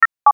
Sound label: BLIPS BRIGHT; Beep; Multimedia; Beeps